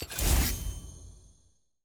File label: spells open; champ select